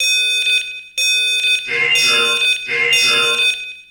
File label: warning